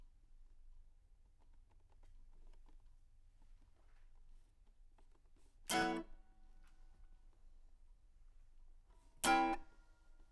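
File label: guitar Mic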